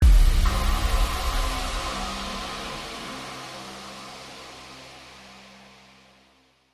Wrecked Stinger; Need for Speed

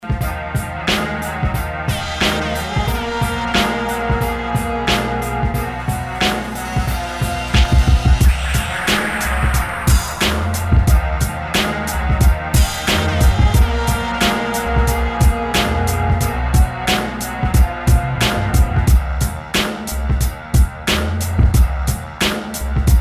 New Menu Music Loop; battle bears 1

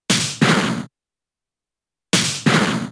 Double Hit 2 hits; Pokemon; gen 7; SUMO; USUM

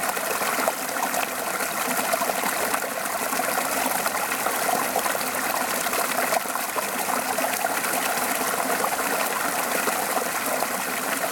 Ice Cliffs, stream babbling close

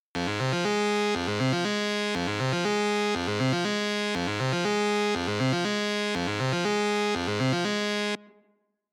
Red Fog Analog Hard; Get Even

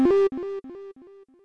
Oneshot Jumpblox Success

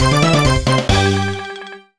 Unknown Fanfare; the legendary starfy original soundtrack 2018

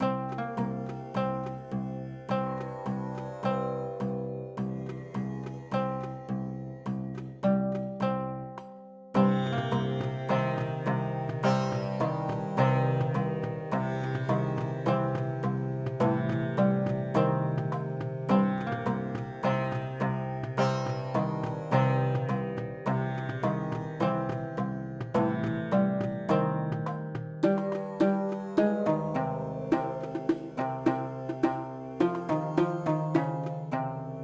Calm; Sahar; primordials battle of gods windows gamerip 2021

